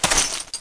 player grass

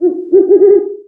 owl

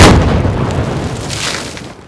explode

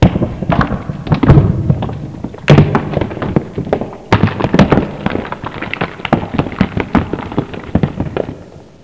building wall

